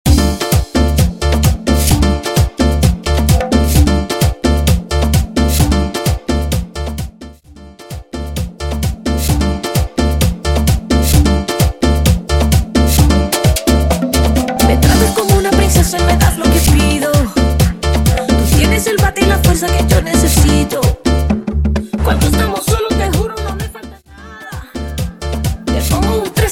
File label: Mambo Project 130 BPM; Bootleg Mambo Remix